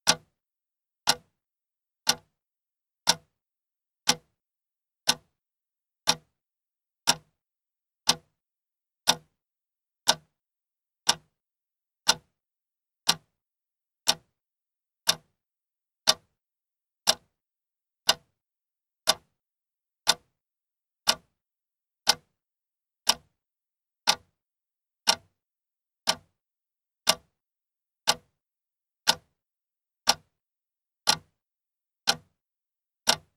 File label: Clock ticking